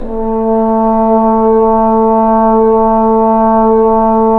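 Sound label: brass, F.Horn Solo pp; Roland L-CD702; F.Horn 3 pp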